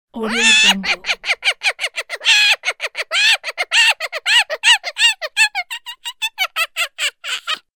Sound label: grampy gnome long laughter